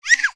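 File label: baby frightened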